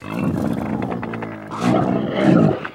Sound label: Camel Growl Snort; camels